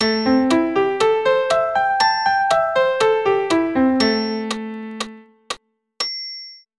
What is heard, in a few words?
Am7 arp, keyboard